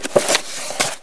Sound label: book